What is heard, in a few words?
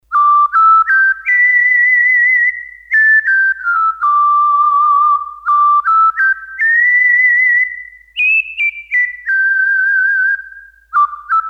Whistler